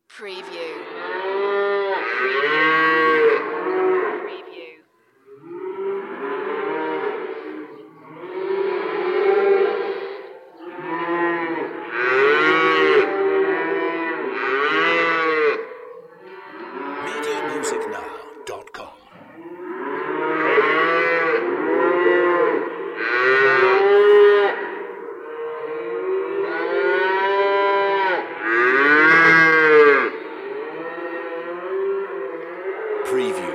Cattle Stockyard Indoor

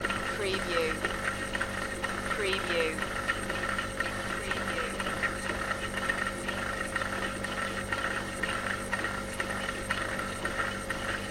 dishwasher running

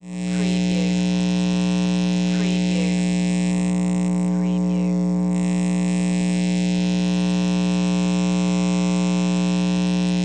Electric Transformer Hum

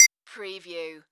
Multimedia Beep

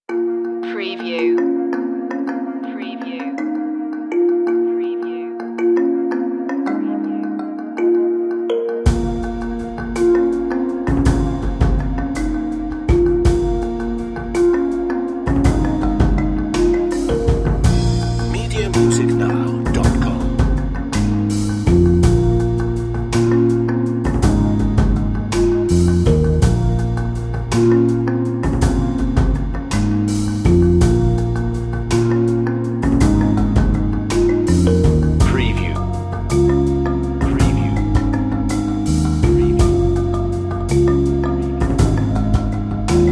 mystic river underscore short

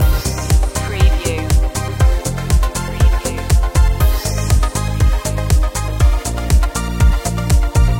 dance floor loop

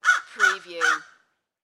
Bird Crow Caw Multiple; sound-effects-bible

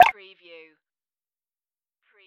plop

